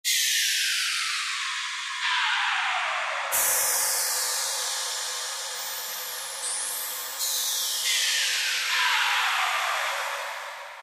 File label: Steam, Korg T3